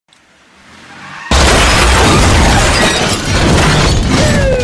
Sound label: car crash; audio meme